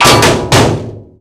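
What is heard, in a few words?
metal pipe snap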